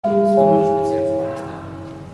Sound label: 2 tone intercom